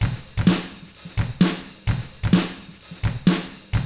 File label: HARD ROCK, Drums